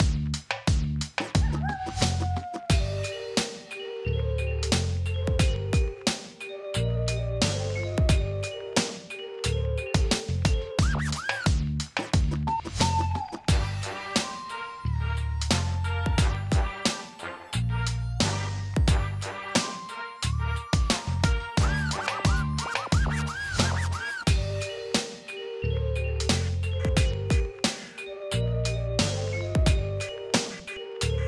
89bpm; Cruel Mushrooms

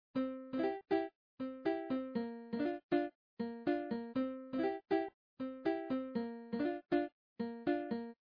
Endmusic Guitar; gamerip; Papa Louie When Pizzas Attack

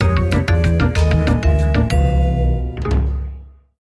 Lose Game

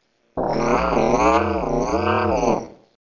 Shake; Talking Roby the Robot

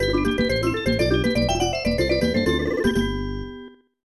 victory; umi no nushi tsuri snes gamerip 1996

